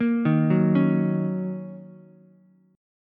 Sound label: D sharp Minor 7th, Ukulele; arpeggiated; JGuitar